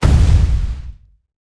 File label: smoke up